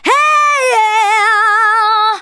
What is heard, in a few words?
VOCALS SUNG; Techno Trance Essentials